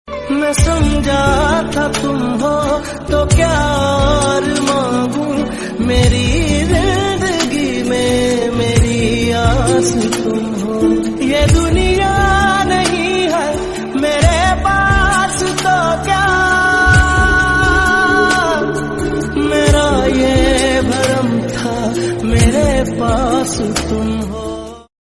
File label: Hindi Sad Song Ringtone